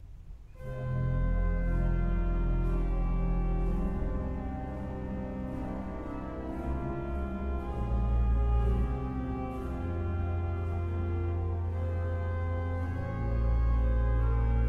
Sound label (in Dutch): orgelklanken; Bekende orgelklanken